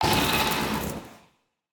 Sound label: Roar